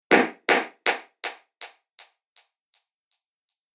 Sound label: Bang Sound With Delay; Impact / Explosion Sound Effect; Bang Sound Effects